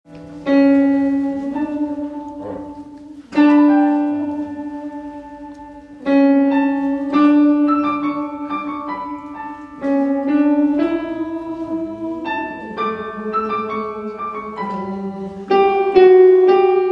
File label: oboe prima parte